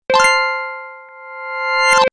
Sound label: end of the game reversed normal; gorilla tag